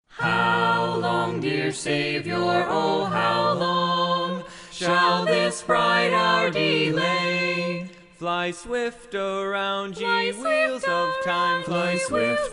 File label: choir